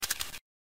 awp zoom; cs go

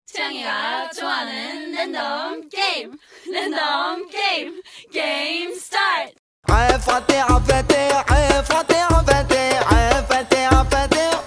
arabic remix

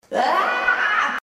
uuuaaaah

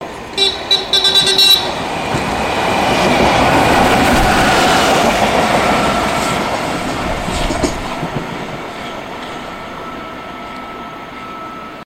train; mpv type

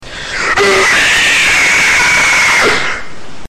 screech

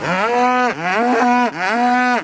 cow mooing

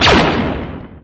y wing blaster; lego star wars

